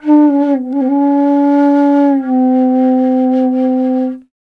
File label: INDIA BASS, IND BASS